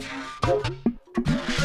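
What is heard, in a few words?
Music Dpad Base 145; from dust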